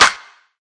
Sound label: urban trap snare; Hip Hop; Urban Trap Kit; style kits